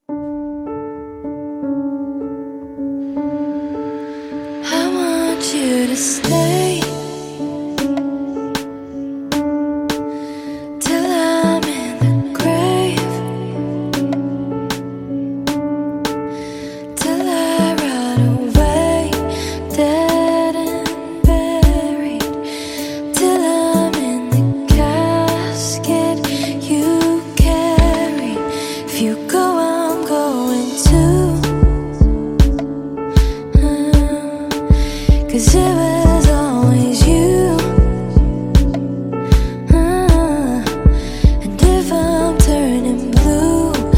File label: Zouk Music